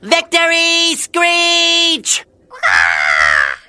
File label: cats victory screech